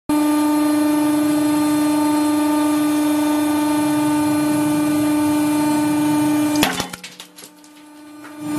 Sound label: press machine